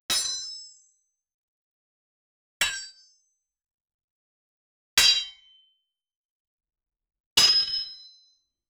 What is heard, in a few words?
a big sword falls on